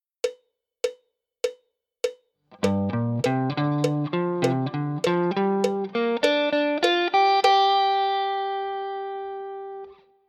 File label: G minor pent lick, guitar